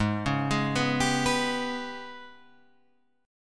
G sharp Minor Major 7th Double Flat 5th; x,11,11,13,11,14,13,x

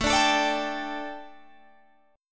A 7th Suspended 4th A, chord; A7sus4 strummed; 17,17,19,19,20,17

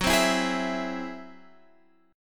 Ab Augmented 7th Gb, guitar

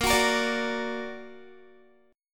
Bb Augmented Bb, Guitar; strummed; 18,21,20,19,18,x

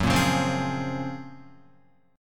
Gb Major Sharp 11th, Guitar; GbM#11 strummed; JGuitar

